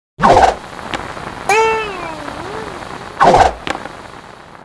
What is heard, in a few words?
Hover Board Action; Seer; GamigoNA